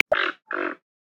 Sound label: Toco Toucan, Amazon; Xiaomi Redmi-Note-8-Pro